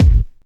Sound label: Bassdrum; Best Service; House Definition VOL-1